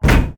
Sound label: damage hits explosions, Warships